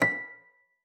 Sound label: FLAG A#6, harp; Xsample VOL-8; HARP+FX